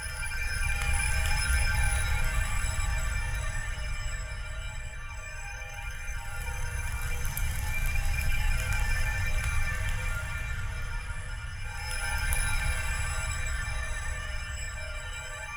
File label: light rune big